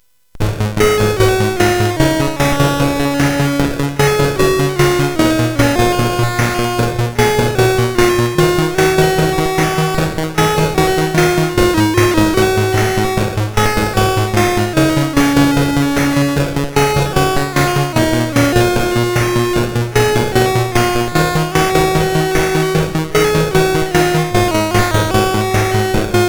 Synth Sample III, CSG8580R5; hvsc

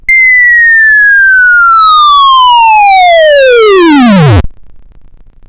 Manic Miner 64DX, CSG8580R5

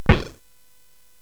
SFX; Narco Police; hvsc

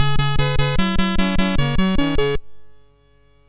Moon Hop, sid, MOS6581R3

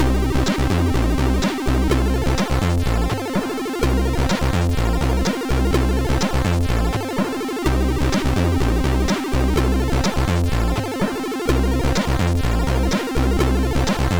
sid, MOS6581R2; Shabazzed

